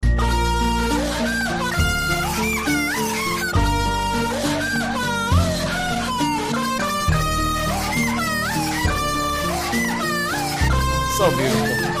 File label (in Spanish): linda melodia